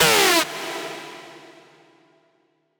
synth screech oneshot G#; Synth Hits; GhostHack

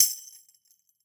Tambourine Medium; World Percussion; Cymatics; Emperor World Percussion

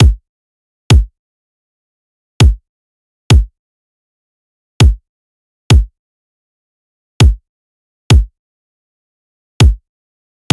Drum Loop 100 BPM Kick, Midtempo; Carnage; Cymatics; ANALOG EVOLUTION Beta

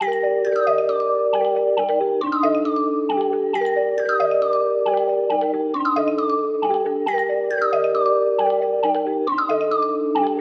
136 BPM G Min, Melody, Trap; Cymatics; 2022 Melody Collection; Trap Pt 1

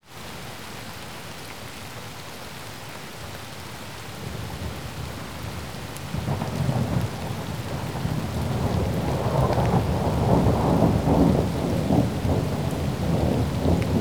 Thunder, rain; FX; Cymatics; Eternity Sample Pack

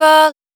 Dry One Shot - D#, Vocal; Tonal One Shots; Cymatics; Euphoria Vocal Sample Pack

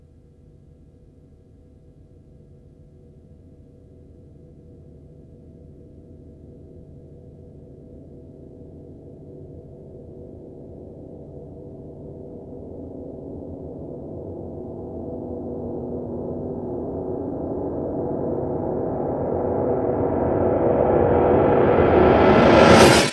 piano fx, Cartoon Film Effects

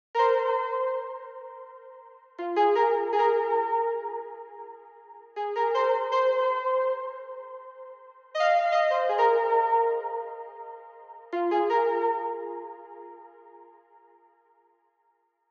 161 BPM F Min Lead; Cymatics; Obsession

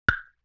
Temple Block Hit; Vintage Cartoon; Drums & Percussion

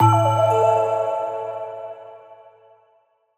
Menu FX Organic - A6(Sus2), Video Game; Cymatics; Video Game Sample Pack Vol 1

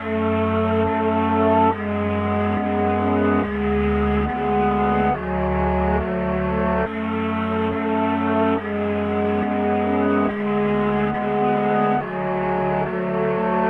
140 BPM F# Min, Melody, Drill; Cymatics; 2022 Melody Collection

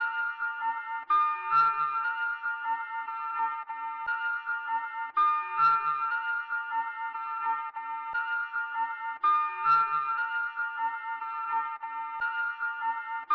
118 BPM D Min Ambience; Melody; Loop Stems; Cymatics; 2022 Melody Collection Platinum Expansion; Off White

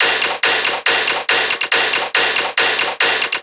Bass Loop D# 140BPM, Dubstep

